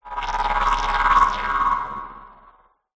fm stutter digital delayed; sound design

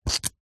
food ice cream cone slide pull out from another; zapsplat

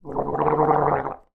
human male gargle mouthwash

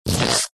cartoon squash deflate; zapsplat

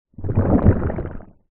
human stomach gurgle hungry or ill

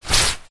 raincoat sleeve arm movement walking single

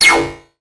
metal stab; sound design

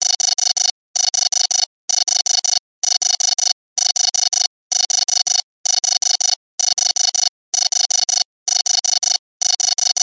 science fiction console alarm

